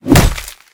Hammer Medium Hit

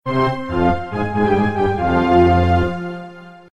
sound lose round; Monster Arena

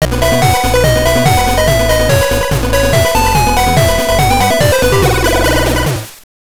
Race Won; lego racers 2000 game boy

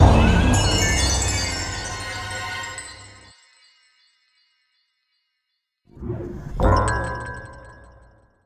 Game Over Jingle; World's End Club

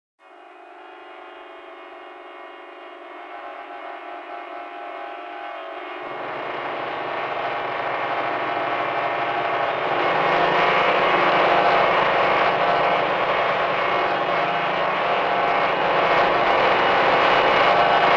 Mine Tension; Outlast 2; PS4 Windows Xbox One

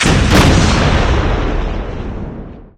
explosion; SFX Fatman

